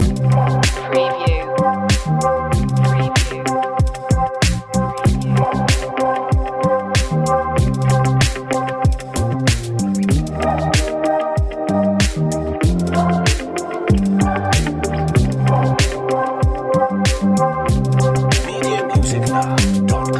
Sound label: dark indie electro loop